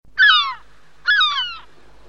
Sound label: Seagull short